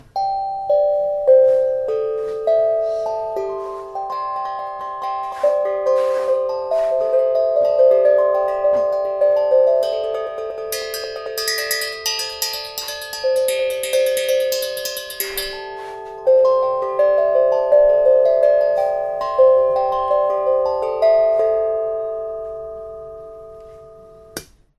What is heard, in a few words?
steel tongue drum 8 notes; for children